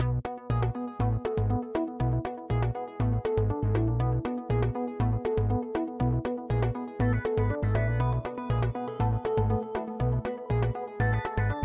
Writing Round; Death by AI

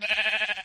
sheep attack; mineworld

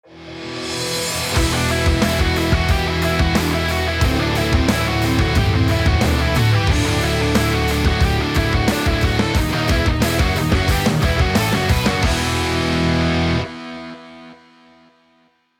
rock intro

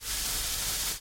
smoke loop

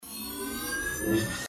laser start; ThreeHeadedDragon

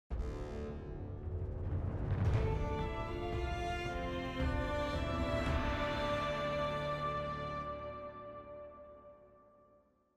success oneshot; town guard; everdawn; quests

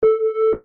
beep

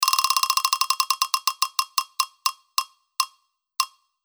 Spin

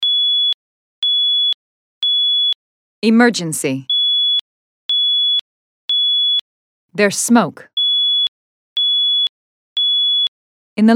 emergency; smoke-co-alarm-v2; nest

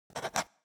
mail new

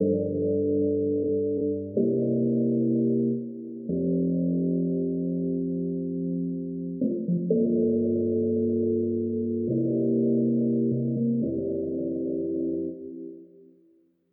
CHORD

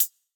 hihat, electronic

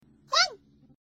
bark; korone; vtuber

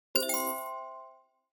ding

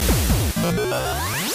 death

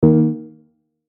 wrong pick

